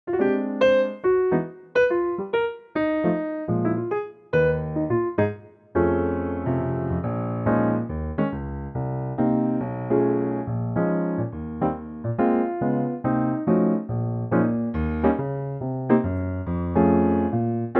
Ab piano; key - Ab - vocal range - Bb to C; Popular old jazz standard in a 2024 piano only arrangement.

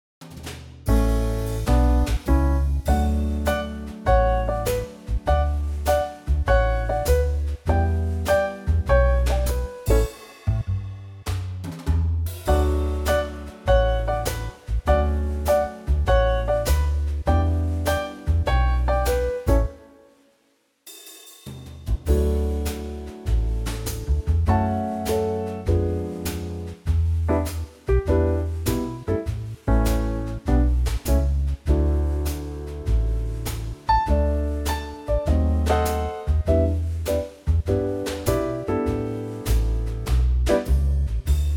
D Trio

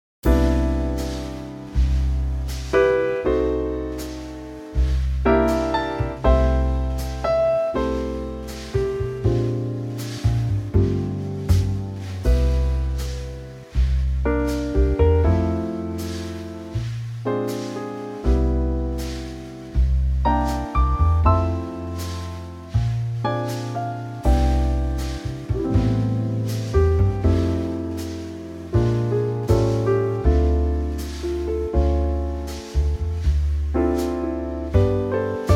C trio; key - C - vocal range - E to A

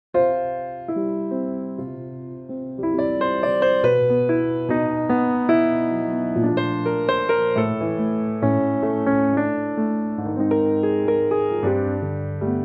C piano

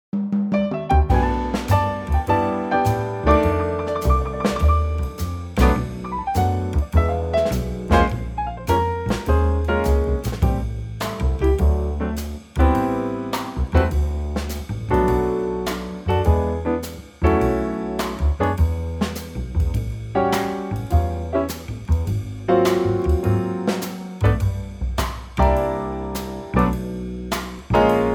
G Trio; key - G - vocal range - D to A; Moody Trio arrangement

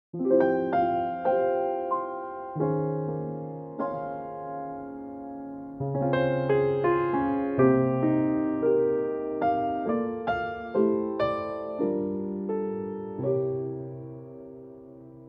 F piano

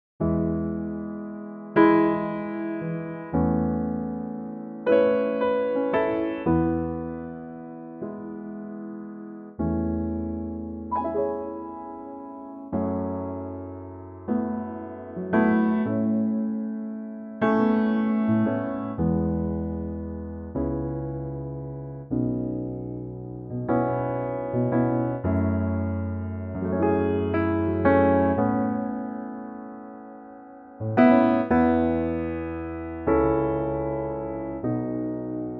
E piano; key - E; more open and chordal based, with a slight swing

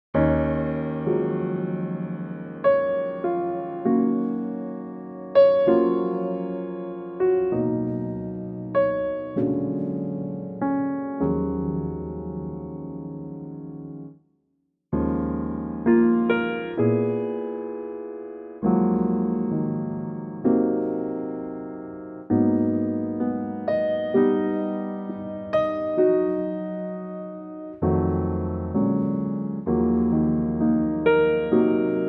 Gb piano

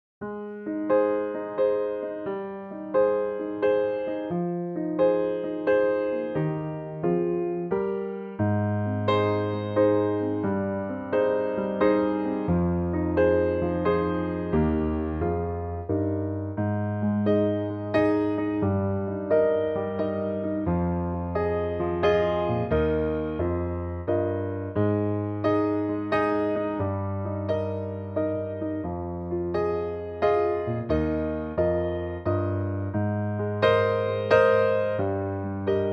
Ab piano

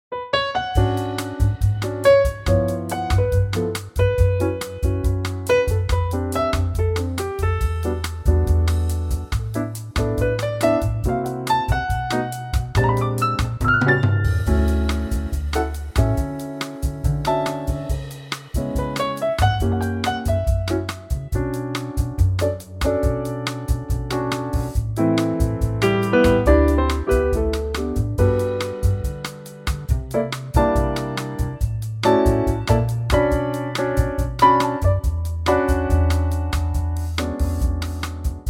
D latin

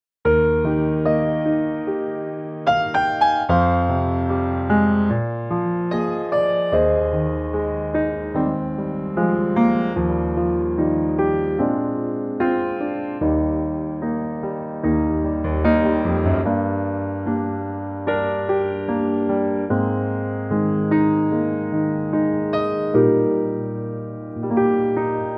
Eb piano; key - Eb; Pianotrak; piano only arrangement

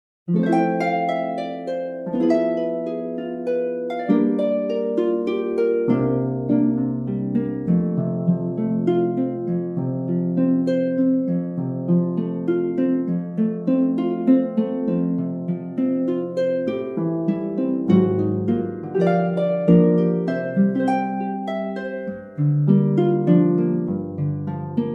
harp F; key - F - vocal range - A to Bb

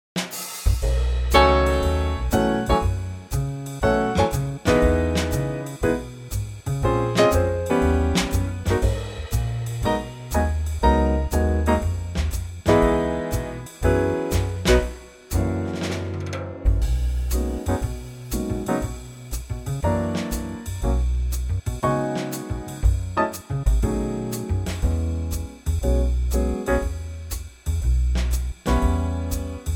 swing B

